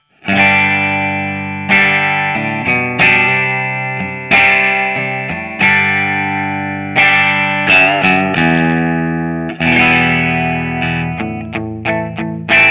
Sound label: M pickup Vol 11 Treble 14 Middle 11 Bass 12